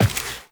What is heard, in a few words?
Step Default, Footsteps, Walk; Warframe; Characters; Tenno